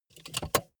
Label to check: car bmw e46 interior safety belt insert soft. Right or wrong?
Right